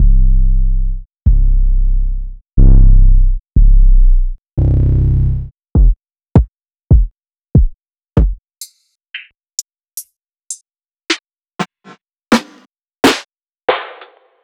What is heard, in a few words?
Drum Kit New Skool; Nuttkase